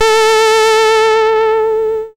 SID Lead A; ChipShop Deluxe; ChipShop Hits

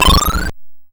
Coin Shudder; SFX; YM; ChipShop Deluxe; ChipShop Hits

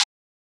Closed hat, techno drum

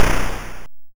YM Noise; NOISES; ChipShop Deluxe; ChipShop Hits

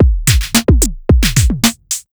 Beat 110bpm; musicradar

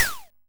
Ym Multi Tom B3; multi-samples; CHIPSHOP DELUXE

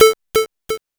PO Synth A; SYNTHS; CHIPSHOP DELUXE; CHIPSHOP HITS

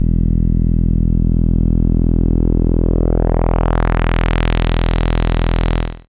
SID Bass E, chip; CHIPSHOP HITS

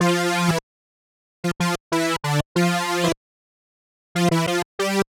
Synth Line 94,hiphop samples; 94bpm; musicradar

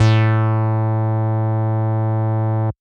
DnB Bass A2, drumnbass 170bpm; musicradar; Bass A Multis

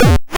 YM Kick, DRUMS

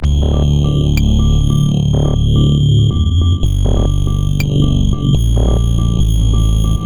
140 Synth D, 140 BPM; loops; CHIPSHOP DELUXE

